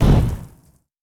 Large Explosion; A Robot Named Fight

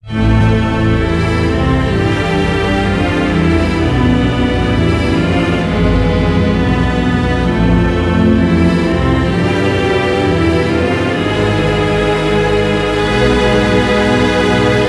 Sting; wcw mayhem psx

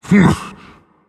Vocal Colossus Pain Reactions

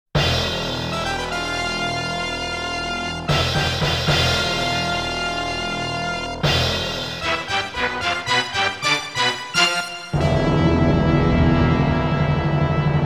Insert Coin; Taito 1500 Box; Scitron 5th Anniversary

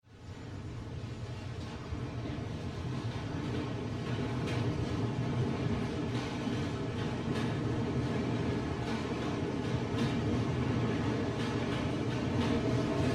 Train Sound; Granny 3; Android

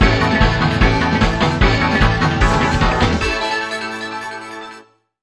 Arcade Game Start; madagascar; ps2

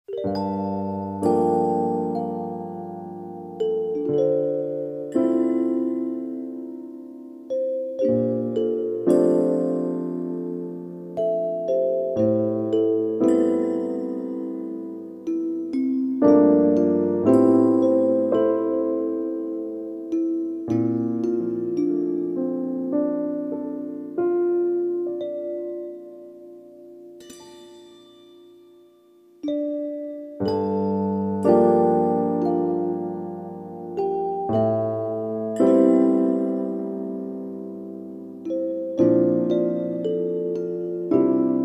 Pub; Heartflame Pub

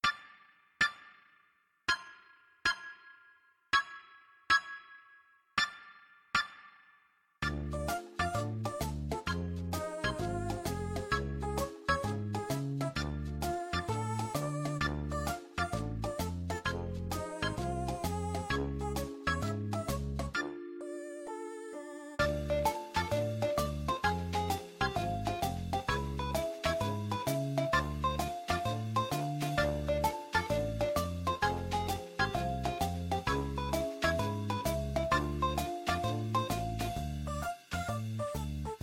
Ingame Music; Bucketz

